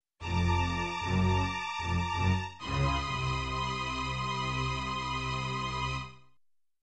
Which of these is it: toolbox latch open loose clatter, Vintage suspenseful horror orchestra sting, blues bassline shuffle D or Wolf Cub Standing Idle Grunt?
Vintage suspenseful horror orchestra sting